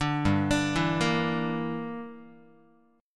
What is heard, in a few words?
G Minor 6th, Guitar; Gm6 arpeggiated; JGuitar